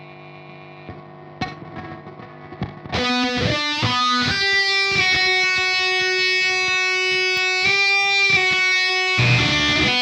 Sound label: guit solo